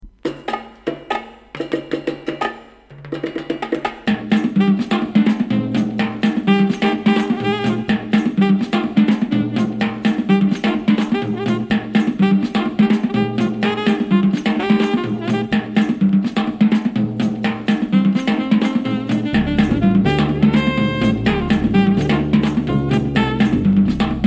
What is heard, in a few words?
conga sax